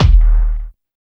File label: HIP KICK; Drums In Your FACE!; BONUSKIK